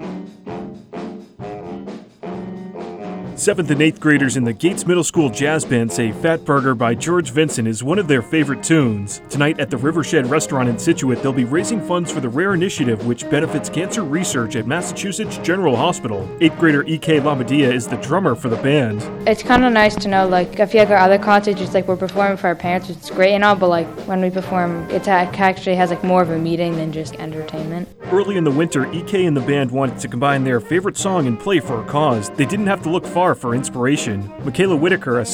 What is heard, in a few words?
Jazz Band; Rowe Scituate